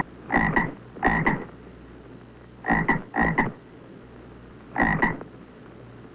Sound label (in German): frosch